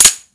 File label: dryfire; Guns; FPS Kit Version 2.0; slendytubbies